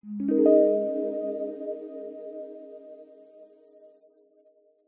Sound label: multimedia game sound zen game soft warm relaxing positive tone